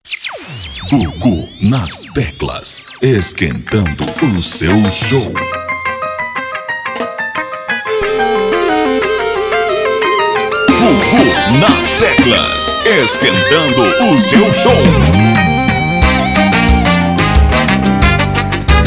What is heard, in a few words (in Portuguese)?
abertura São João teclas instrumental